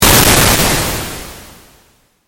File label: bang; YARS: bang